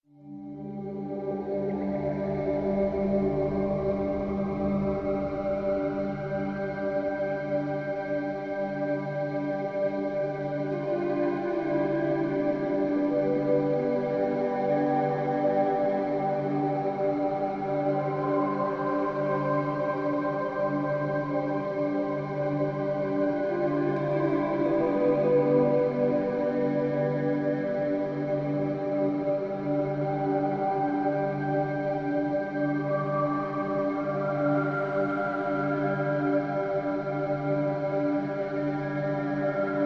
Amb Sound Scape Airy atmospheric effects, Sparse guitar plucks, Drone; Outer Space; Ambient SoundScapes